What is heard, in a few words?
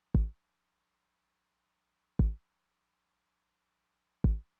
kick drum, Roland TR-909; drum machine; Roland TR-909 Ableton Project